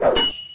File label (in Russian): zamah i udar mecha